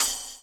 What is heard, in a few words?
COPS; Spectrasonics - Backbeat